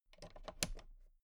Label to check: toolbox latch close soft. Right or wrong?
Right